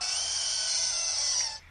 cry; kyogre

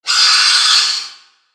screech

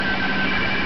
jumpscare sound; hybrid freddy

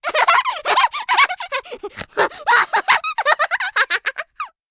creepy laughing

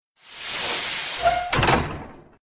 door opening; scp

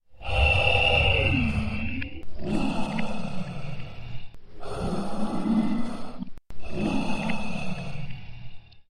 breathing; scp-049

